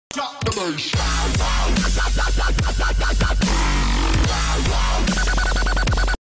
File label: dubstep meow